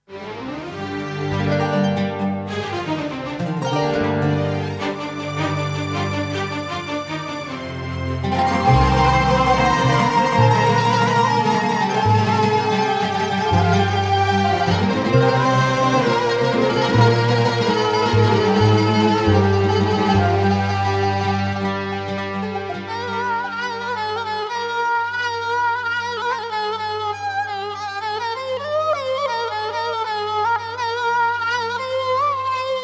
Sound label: Kaman; Demo Instrumente